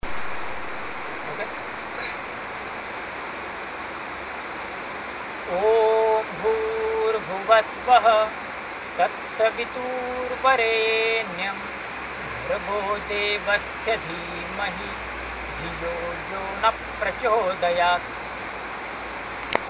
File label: mantra